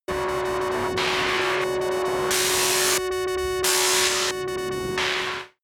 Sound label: scatter vent synth